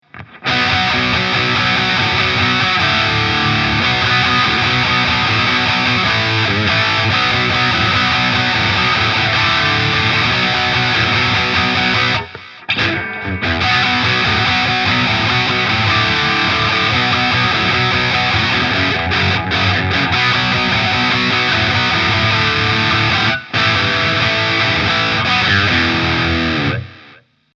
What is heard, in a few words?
Off full Gain midscoop auf 10h